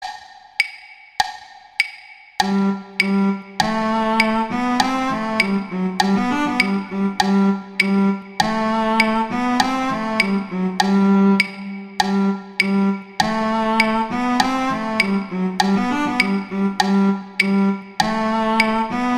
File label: Duet Cello II; Duets; Mozart; Duet 8